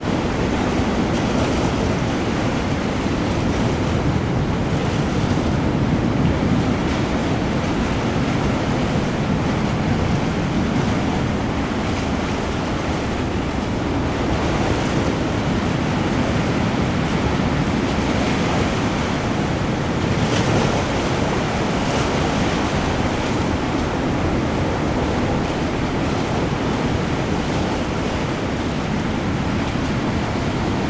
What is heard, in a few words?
water ocean waves rough sea under pier